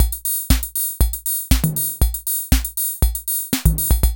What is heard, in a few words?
DISCO, KR55; INIS; Funky Xtreams